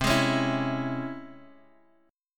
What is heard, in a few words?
Db Minor Major 7th C